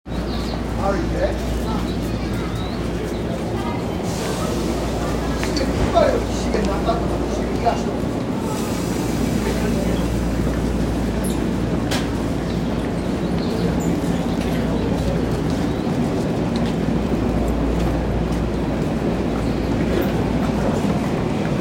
西武新宿線高田馬場西

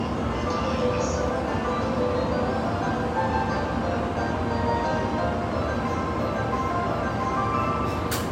京浜東北線新杉田北, train sounds

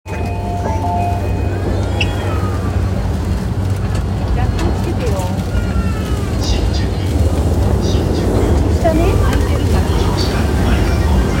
JR 山手線 新宿 CW, train sounds

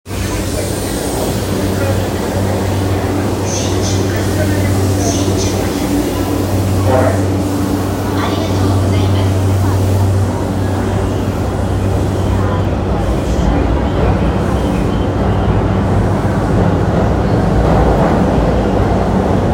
JR山手線新宿南話す, train sounds

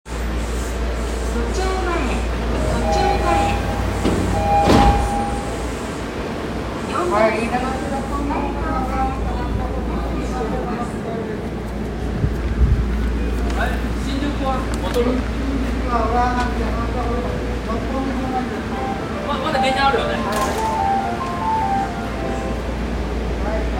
都営大江戸線都庁前西, train sounds

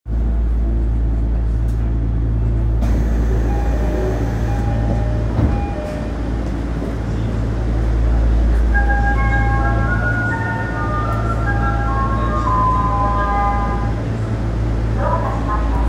丸ノ内線新宿御苑前東, train sounds

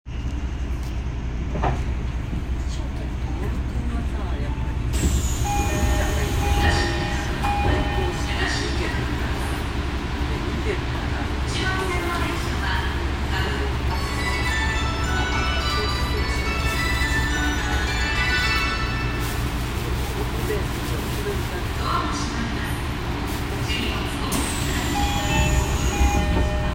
有楽町線東池袋東, train sounds

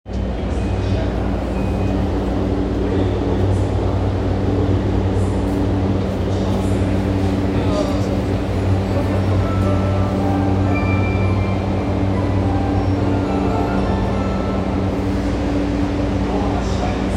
半蔵門線清澄白河西, train sounds

